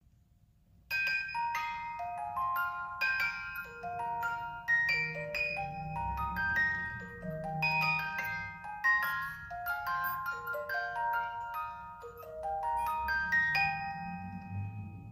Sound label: 18 lames, boite a musique